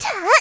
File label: attack; Eternal Return; Tia; Voice Line; KOR